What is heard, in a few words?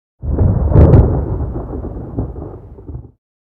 Rain Cloud Thunder; emotes